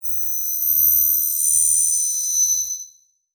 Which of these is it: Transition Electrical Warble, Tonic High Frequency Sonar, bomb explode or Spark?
Transition Electrical Warble